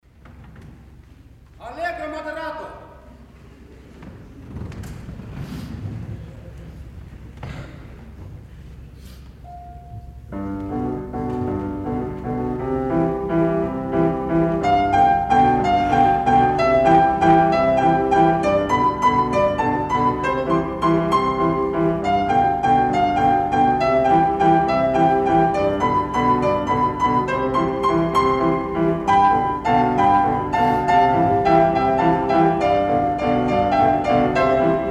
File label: Klaver Allegro Moderato